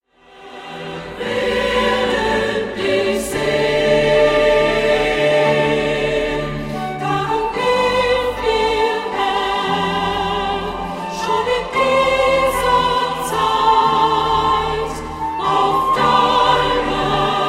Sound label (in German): Chor